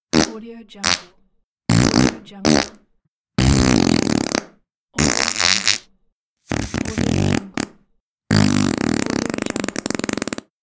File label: fart; soundseeds